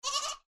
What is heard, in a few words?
goat bah